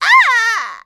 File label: Enemy Generic Human Death Crushed